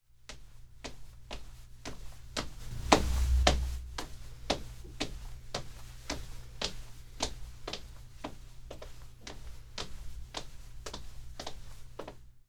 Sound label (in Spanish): Pasos En Suelo De Concreto